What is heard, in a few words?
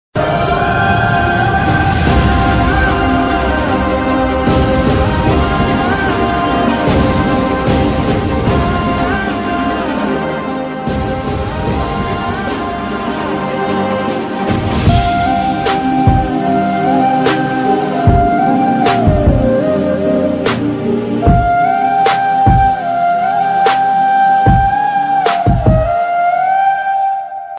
love song bgm ringtone; jeyam ravi; thani oruvan